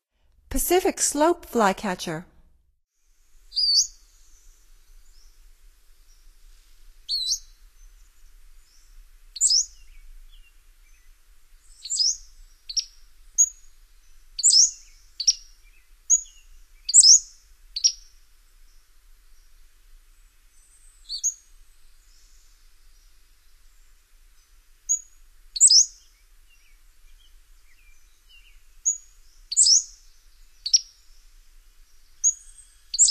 Pacific-slope Flycatcher, Songs of Birds of North America; Stokes